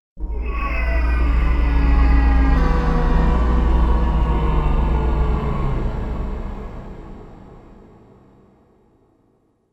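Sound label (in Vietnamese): Âm thanh linh hồn địa ngục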